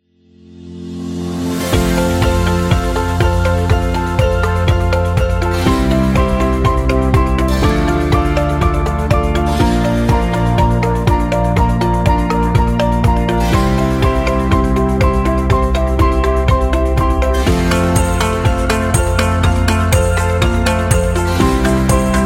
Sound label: Cute; cooking show